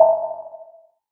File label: Sensor Array Operating